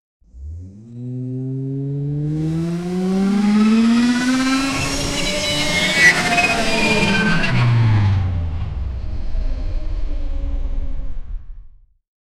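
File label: moving futuristic, flying car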